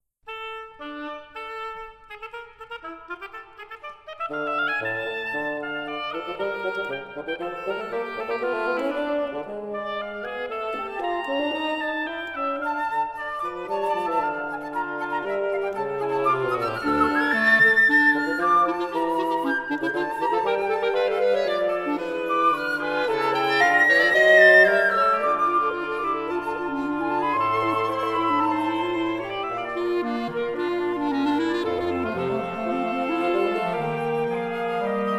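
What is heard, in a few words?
wind quartet; goepfart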